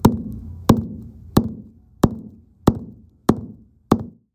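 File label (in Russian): молоток бьющий по столешнице звучит почти как барабан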